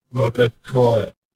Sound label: feedback achievement